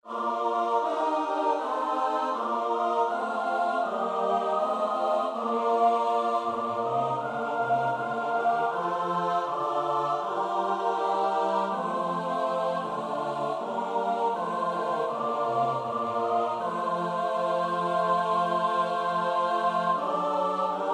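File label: soprano alto tenor bass; Bach (Johann Sebastiaan); BWV 013; 6.So sei nun, Seele, deine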